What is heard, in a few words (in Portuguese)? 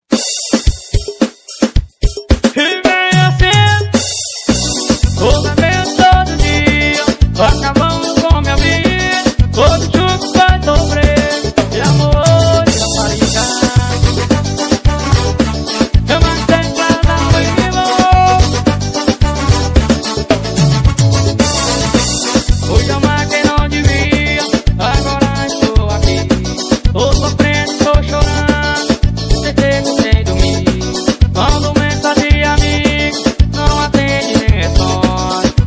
amor de rapariga forro